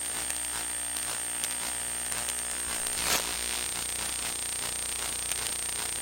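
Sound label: ambient hum buzz loop, electric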